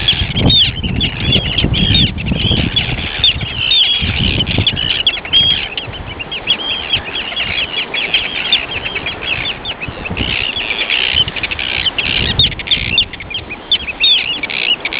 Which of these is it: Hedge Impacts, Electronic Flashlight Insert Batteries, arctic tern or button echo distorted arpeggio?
arctic tern